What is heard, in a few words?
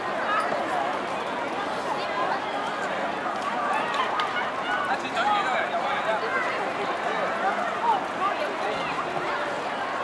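crowd; Mafie 1 cz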